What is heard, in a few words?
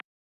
Keypress Standard; China Telecom